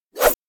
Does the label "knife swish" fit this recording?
Yes